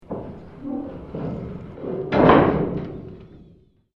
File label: Door Metal Close Squeak Slam